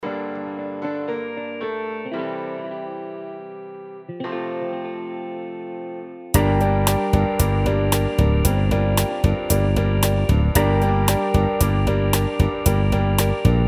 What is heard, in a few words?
backing track; saxophone alto tenor